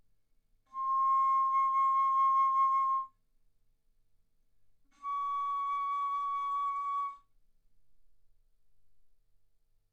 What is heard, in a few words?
Bass Flute ff C6 Db6